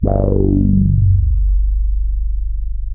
G1, Boom da Bass; E-MU Producer Series Vol. 6 – Analogia Project (CD 1)

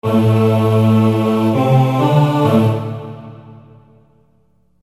Gangsta Ahh; Construction Kit; HIP-HOP; Sonic Foundry (Sony Creative Software)

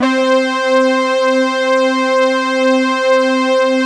Obie Stack; Optical Media International; Sonic Images Library; Doubie Stack

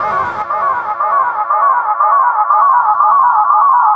Jazz Noise; Sonic Foundry (Sony Creative Software); Leo Cavallo Bass Taster; Special Fx

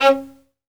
Synclavier Strings, SOLO VI; ILIO

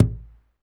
UGANDA DRM, UGANDAN

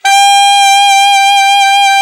ALTSAX D3; Best Service; Alpine Volksmusik

